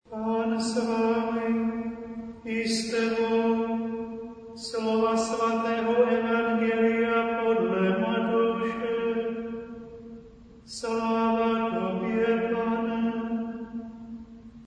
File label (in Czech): pred evangeliem choral